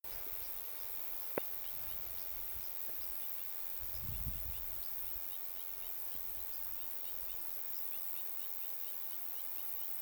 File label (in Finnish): tiklipesueen aantelya